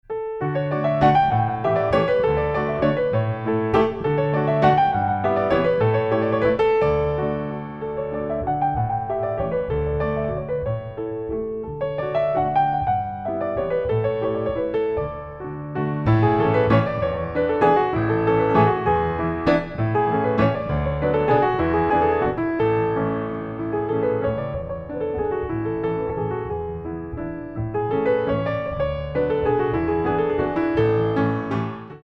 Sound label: mazurka d major; chopin